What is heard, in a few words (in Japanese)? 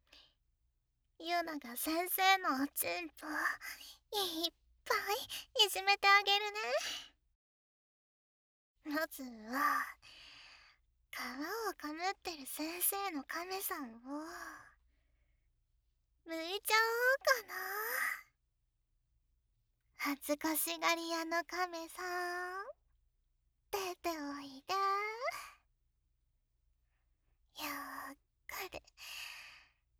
亀頭指コキ・耳舐め SE無し; SEなし